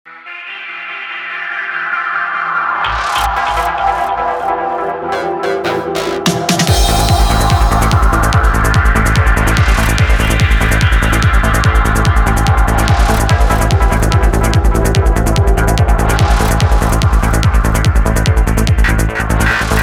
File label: pleasing sound